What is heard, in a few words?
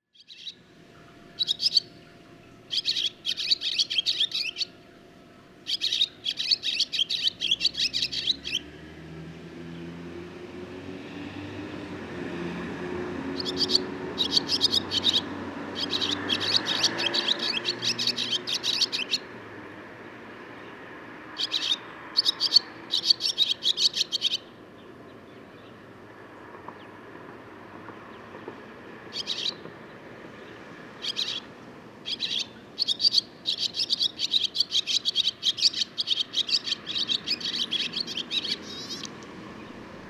Barn Swallow